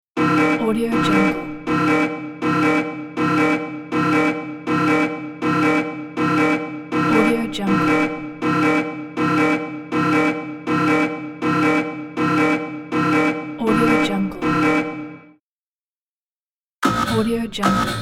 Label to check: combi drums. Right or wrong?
Wrong